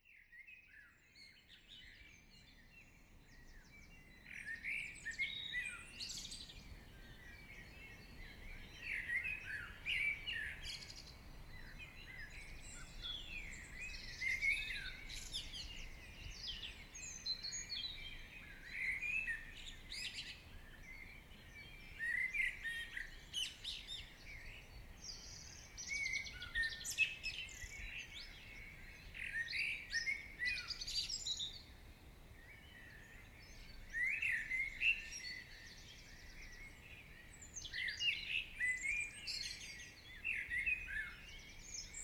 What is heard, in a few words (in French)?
Chants Oiseaux du Matin; Nature